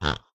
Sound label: pig idle